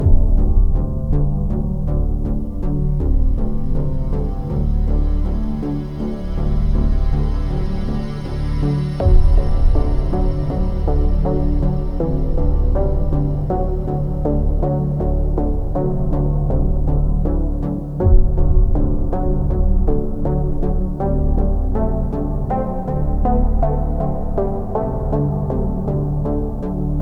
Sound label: Music scorescreen; Kick & Fennick